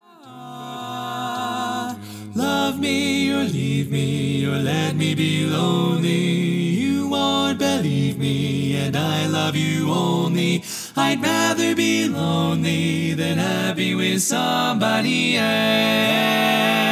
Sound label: Lead